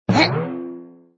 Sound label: emotion sweat; wanNingTaiQiu